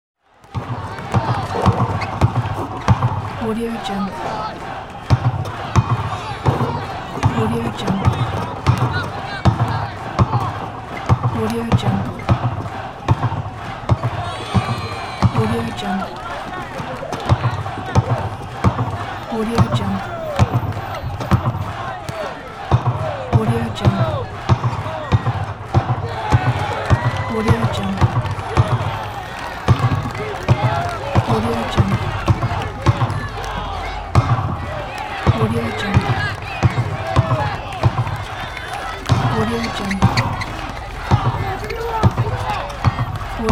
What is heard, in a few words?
basketball dribbling with crowd ambience